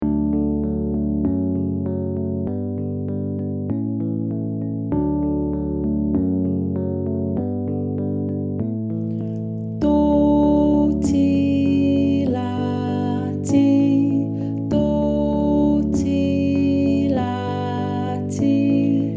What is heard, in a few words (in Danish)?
vi er flyverkor; musikflyveren